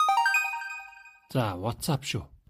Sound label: whatsapp shuu